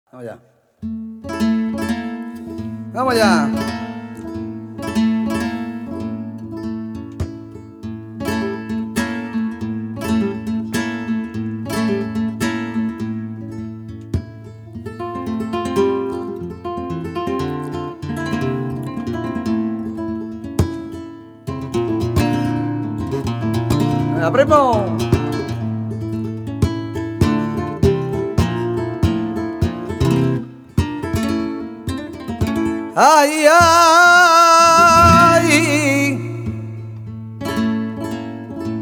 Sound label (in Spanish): Soleares